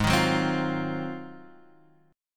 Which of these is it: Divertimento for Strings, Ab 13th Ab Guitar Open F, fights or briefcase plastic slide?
Ab 13th Ab Guitar Open F